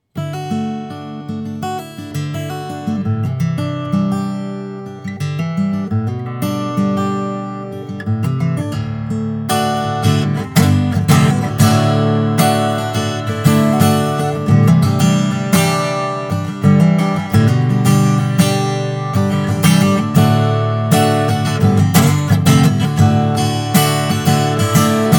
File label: Guitar